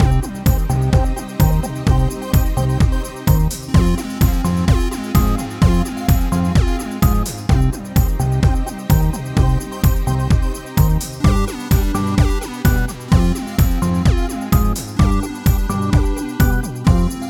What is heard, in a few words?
Arcade Drama, chiptunes